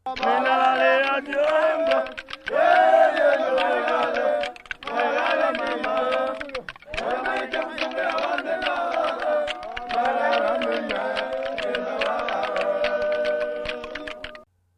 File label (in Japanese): トゥワララ・クチホンゴの即興旋律; africa